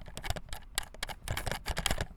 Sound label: Plastic Fidgeting; BB Retail Therapy Sample Pack